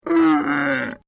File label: Camel Voice; Camels; Animals